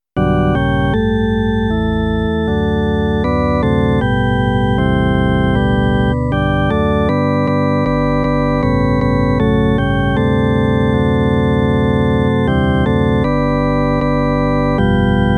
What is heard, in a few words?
He Is Lord, Hammond